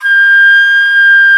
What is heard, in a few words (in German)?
FLOTE SOFT